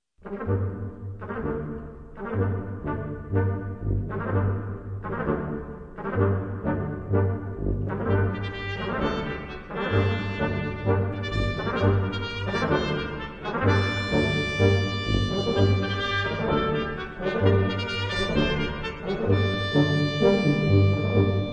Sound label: Brass; Fum, Fum, Fum